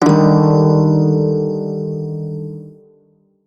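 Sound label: Prime Shawzin Minor Chord, Music; Warframe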